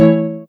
soft hitwhistle; osu

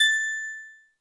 Key

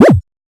normal hitclap

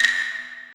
ping ping

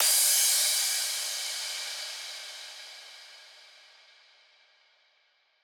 crash; osu

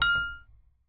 Bell F4

